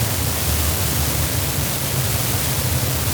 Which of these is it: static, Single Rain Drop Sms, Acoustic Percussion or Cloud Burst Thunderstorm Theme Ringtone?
static